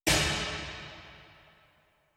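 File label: impulse reverb; impulses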